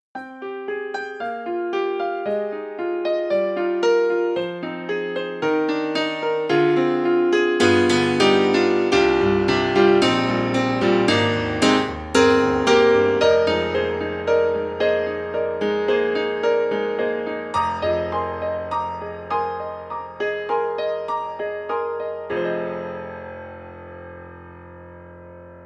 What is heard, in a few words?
KORG KRONOS 2 Piano